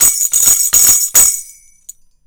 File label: CYMB, Indian Traditions; USB Soundscan vol.45; AKAI